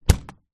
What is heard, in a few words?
flight case close; foley